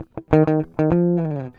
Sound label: GUITAR